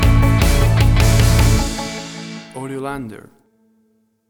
Stinger

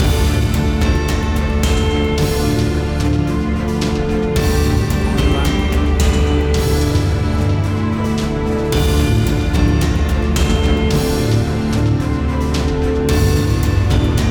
Adventure Action Movie Soundtrack Music

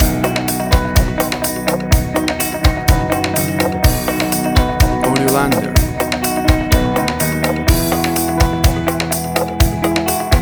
self confident loop

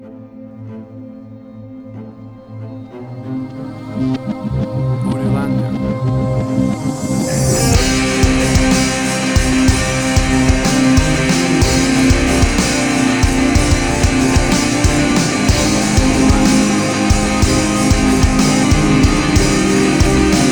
Action Hybrid Dramatic Trailer Music

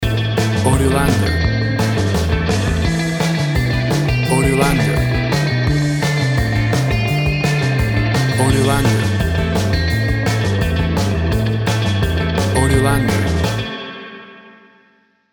60s Surf Instrumental Music

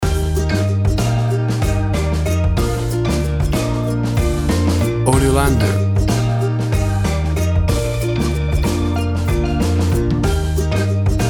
Warm Summer Calypso Sunshine Music

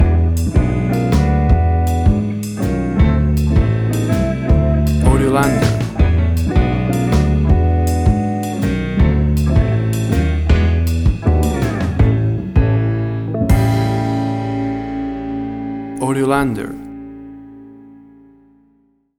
50s Rhythm and Blues Girl Group

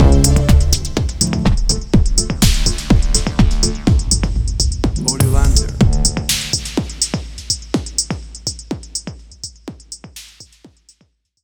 Big Club Anthem Stinger

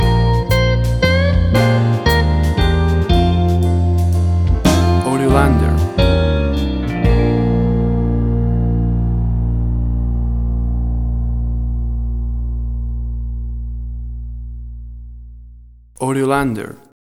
Blues Rhapsody

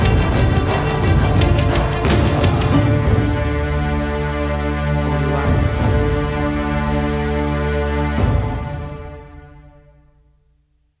Big Action Epic Dramatic Film Score Stinger; AUDIOLANDER

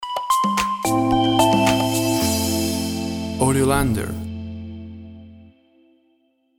Tropical Day Stinger

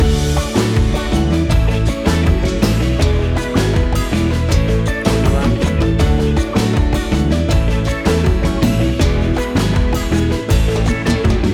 Calypso Island Summer Groove

